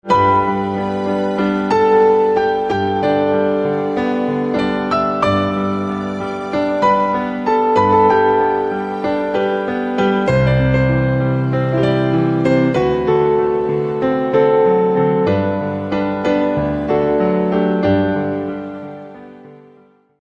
Piano

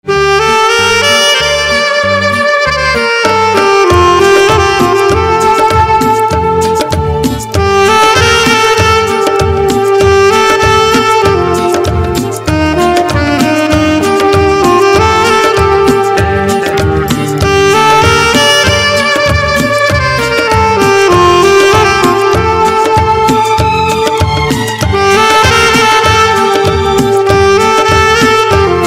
Saxophone, instrumental ringtone

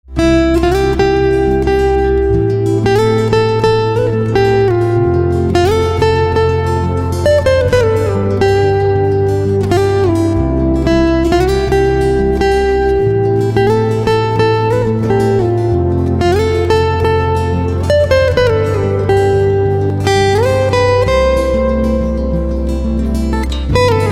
Guitar, instrumental ringtones

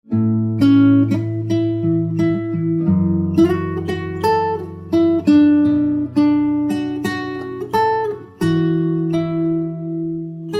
Guitar, instrumental ringtones

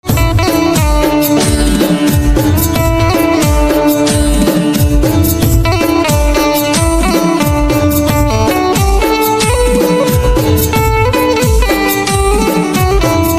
Banjo

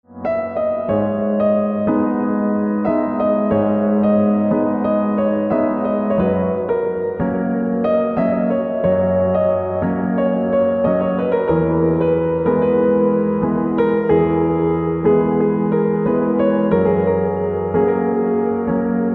Piano

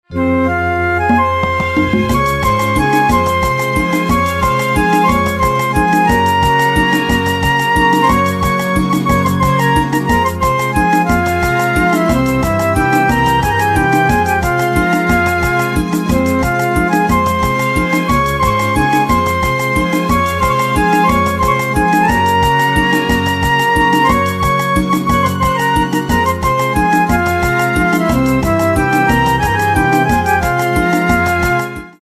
Organ

